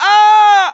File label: hurt heavy; cj